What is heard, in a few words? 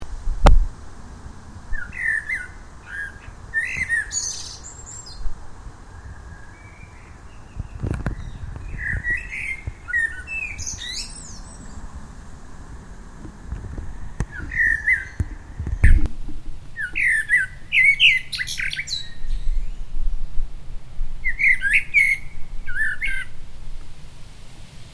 Turdus merula; SETE